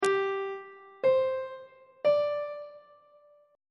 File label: f double sharp suspended 4th triad chord intervals on treble clef